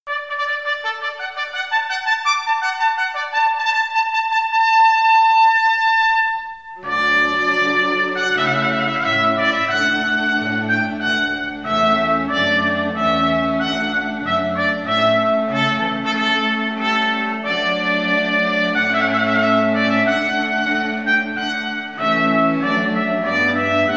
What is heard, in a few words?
trumpet voluntary